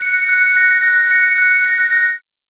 School Subtle Alert

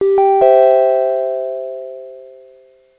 Hospital Pleasant

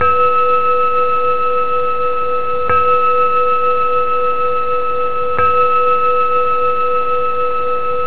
clockchime

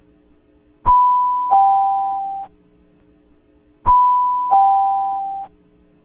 Slow Dual Chime Twice

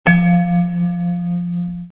chime low

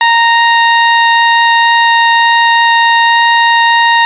Tone Long

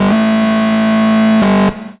Shift Change

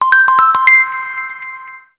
School Class Change

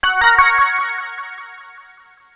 Hospital Pleasant

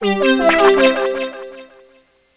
School Pleasant

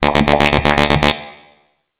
Internal Emergency

